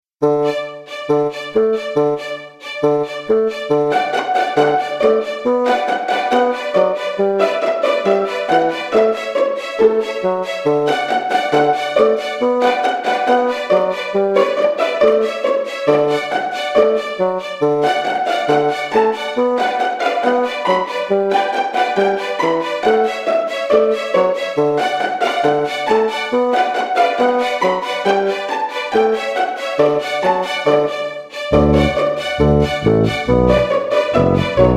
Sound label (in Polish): Reklama Music